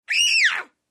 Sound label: Child Startle Scream, HUMAN VOICES & SOUNDS CHILD: INT: Short startled scre